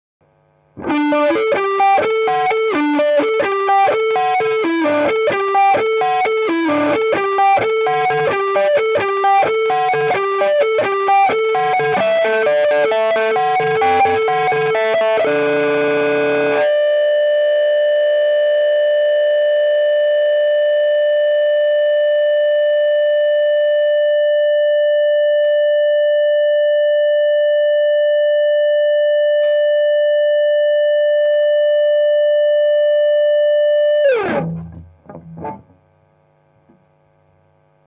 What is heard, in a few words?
es335 midup nck